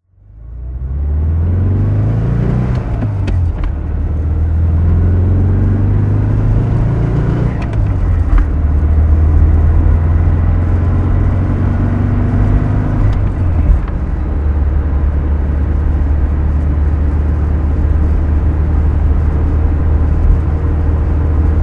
porsche 356c cabrio drive

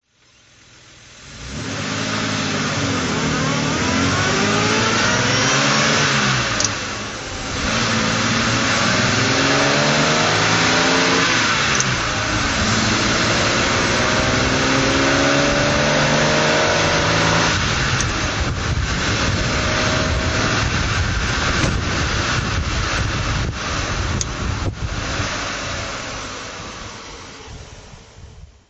heal3000mk3 drive

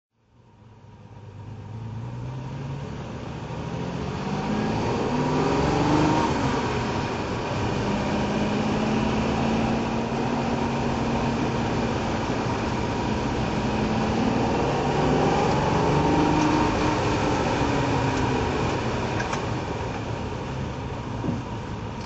ferrari 330gt drive

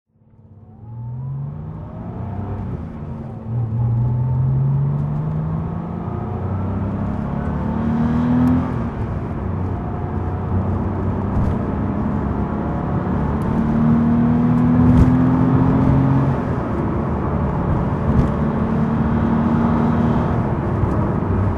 porsche 993 carrera driving

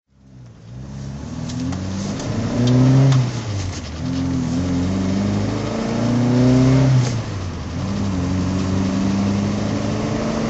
alfa romeo gt1600 junior drive

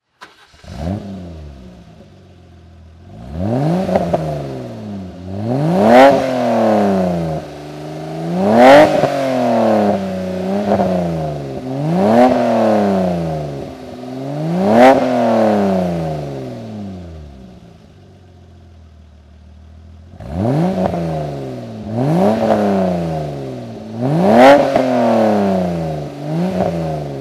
BMW Z1 throttle; BMW Z1, 1990; Engine sound; V3D/ ClassicarImages; Marc Vorgers

